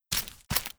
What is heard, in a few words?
Guard Run